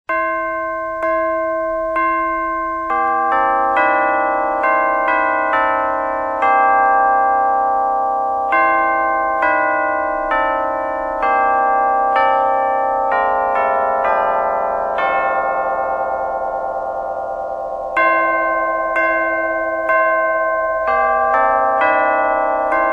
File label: carillon; a mighty fortress